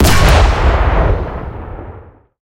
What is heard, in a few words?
cannon 45mm 20k shot, cannons tanks